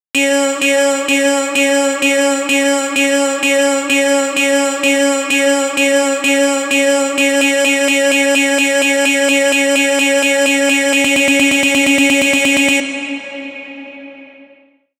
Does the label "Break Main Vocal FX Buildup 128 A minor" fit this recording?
Yes